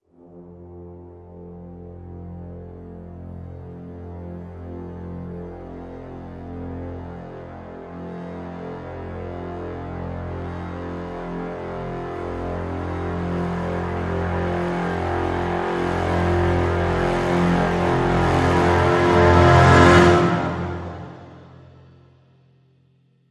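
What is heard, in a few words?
Crescendo Brass Swell Lo (F)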